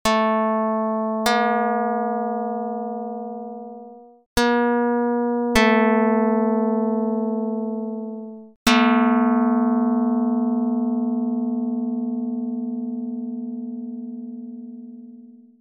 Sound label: pluck; 12/11; dr220